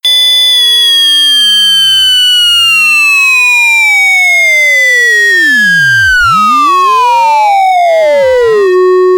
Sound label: triangle